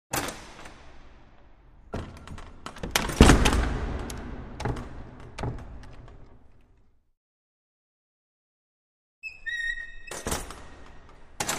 Large Wooden Front Doors 1; Various Closes, Reverberant Interior